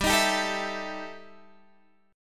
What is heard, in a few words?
E Minor Major 7th Double Flat 5th, G